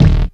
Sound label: Kick